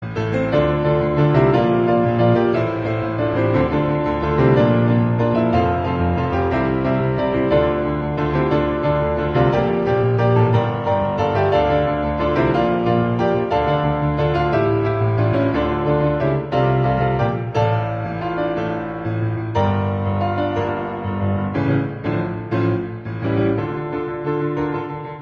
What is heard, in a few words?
Piano; Piratas del caribe